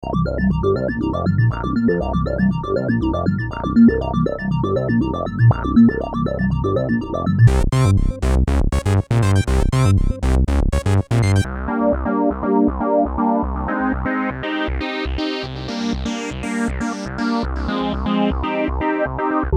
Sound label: Korg EA 1 Factory Presets